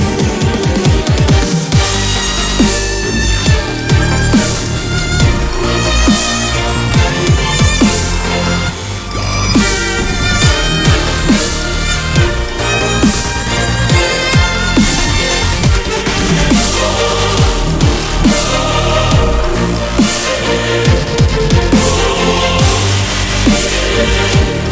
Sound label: dubstep